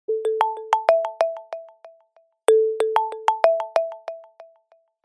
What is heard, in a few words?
samsung primary ringtone